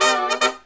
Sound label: LOW HIT, Brass; USB Soundscan vol.29; Killer Brass Riffs; AKAI